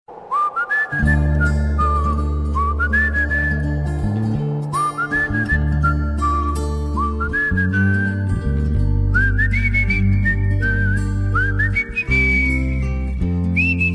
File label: Whistle of Love Ringtone